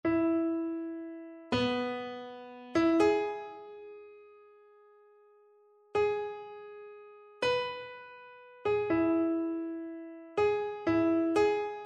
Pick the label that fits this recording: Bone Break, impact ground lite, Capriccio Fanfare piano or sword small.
Capriccio Fanfare piano